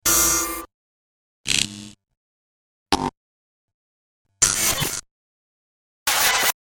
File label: 5 Sounds Production Elements, Electronic